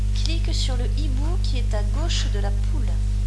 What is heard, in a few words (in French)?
hibou gauche; Abuledu; leterrier